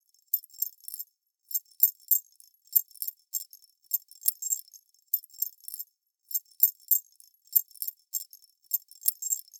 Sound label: Ceramic Glass Shaker Dry; Sample Growing Stream 002; Ear Candy